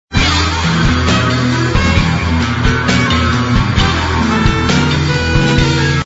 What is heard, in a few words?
BGM